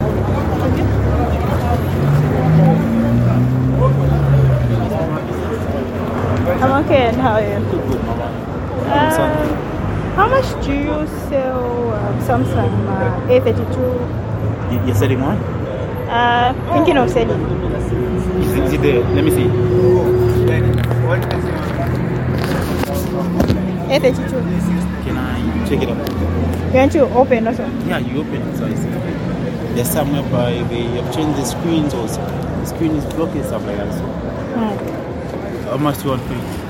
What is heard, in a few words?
Katondo Street